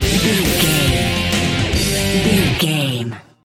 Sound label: sting, Sports Rock, Pop Rock, Rock, Hard Rock, Metal; Sports, Sports Rock, Pop Rock, NFL, Football, Sports Music, Rock, Hard Rock, Metal, Driving, Bikers, Outla